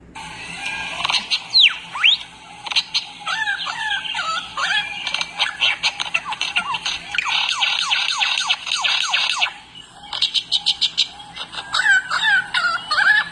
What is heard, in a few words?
Lyrebird